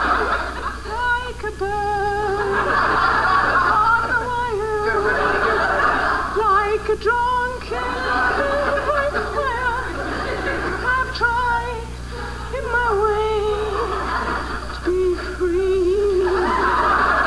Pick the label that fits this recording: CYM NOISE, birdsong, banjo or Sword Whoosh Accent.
birdsong